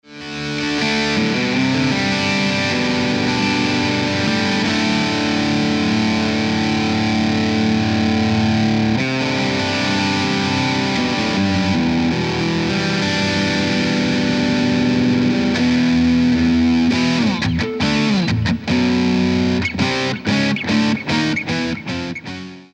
Metal Dirt, Cyber Deluxe

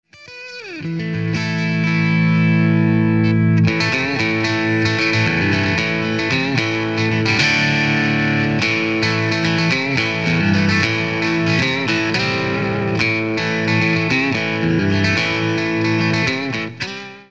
Twang Thang

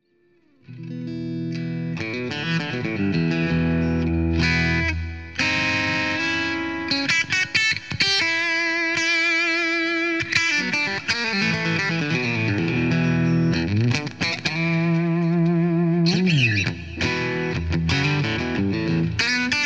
Funky Blues Thang

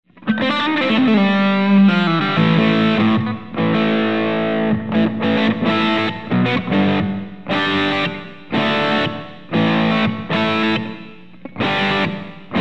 BD2 Full Gain

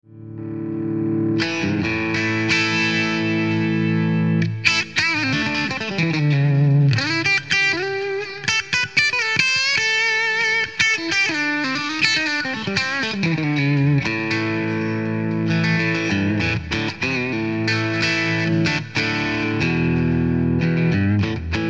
Bridge Leed

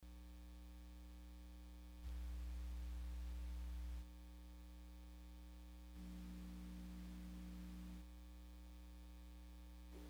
GE-7 Mod Comparison

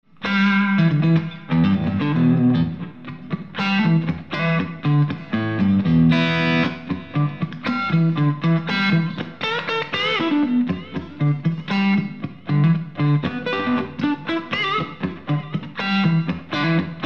SD1 Clean Boost

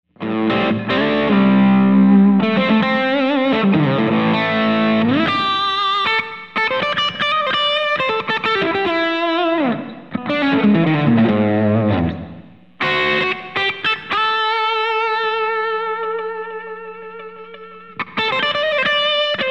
BD2 Half Gain

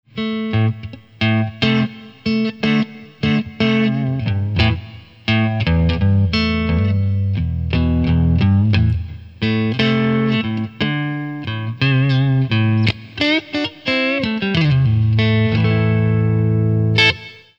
Clean, Cyber Deluxe

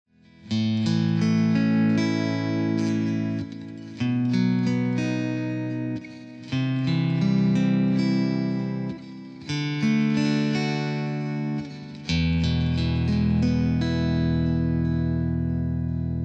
Metal clean, Cyber Deluxe

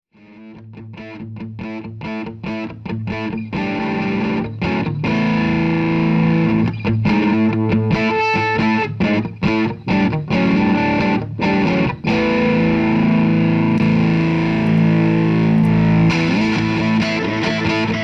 Opto Line6 ODR1

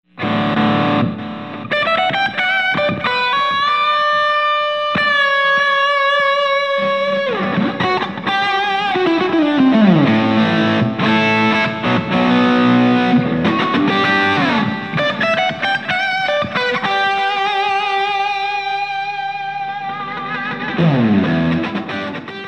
SD1 Full Gain

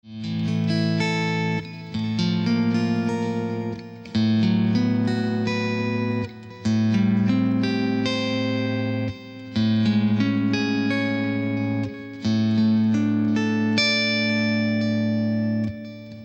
Metal Clean, Cyber Deluxe

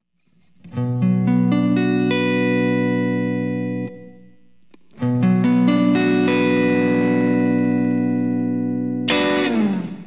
BD2 Clean

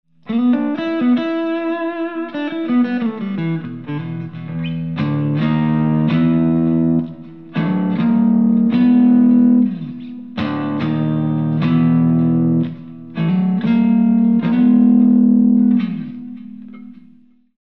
SD1 Clean Boost

